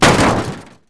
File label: hit vehicle; NASCAR Thunder 2004